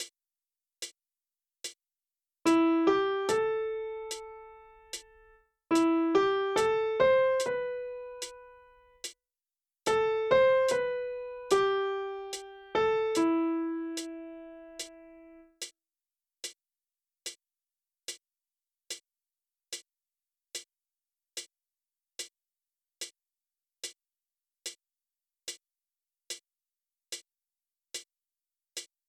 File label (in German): phrase melodie